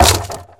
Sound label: Wood Damage, Wood Blocks; fowl